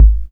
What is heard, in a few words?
kick